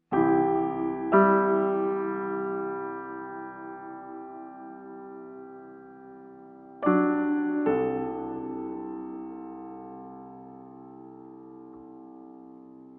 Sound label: tune